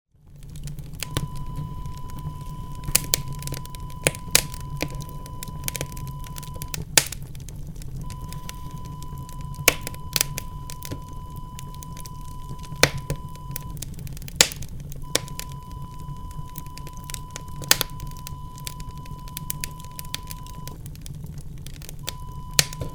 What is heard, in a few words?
crackling fireplace